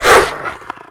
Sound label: Quickstop; netent